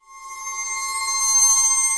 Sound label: Waterphone Swell; Effects; Ableton; Factory Packs; Granulator III